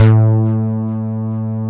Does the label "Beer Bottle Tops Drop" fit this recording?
No